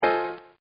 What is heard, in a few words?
BEEP JAZZ; Beeps; Objects